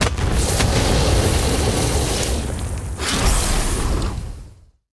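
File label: boss death; morsfera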